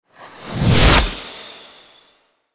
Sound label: Wipe; Toxic Wipe; Ninja Tracks